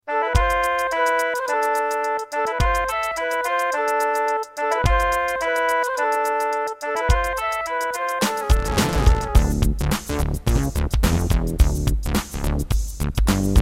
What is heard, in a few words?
Egypt, Roland SC-33; Lost Vikings